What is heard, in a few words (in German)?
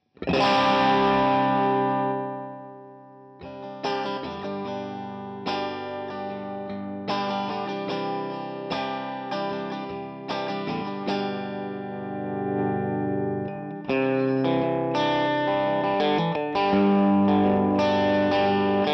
pur Strat; 18W TMB